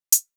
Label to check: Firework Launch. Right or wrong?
Wrong